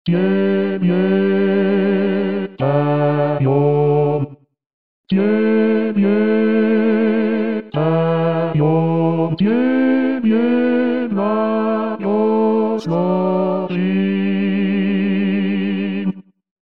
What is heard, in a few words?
Bass; Tchaikovsky; tebe poem